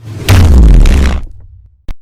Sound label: sun instant teleport; phraxathity